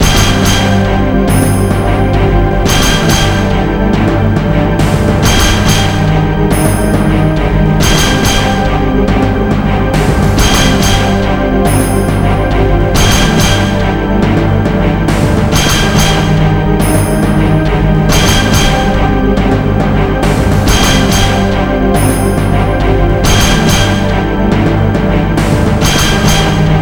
MENU VS HIT; Kinnikuman Muscle Grand Prix Max 2 Tokumori; ps2

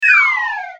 Fall; F.A.M.P.S

